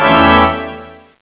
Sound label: piano gun shoot, weapons